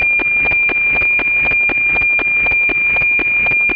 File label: noaa17, satelite